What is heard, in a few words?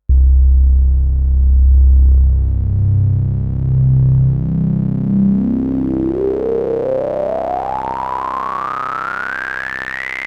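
Lowpass Filter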